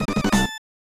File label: Shock; captain tsubasa iii koutei no chousen; snes